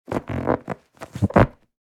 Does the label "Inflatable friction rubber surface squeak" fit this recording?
Yes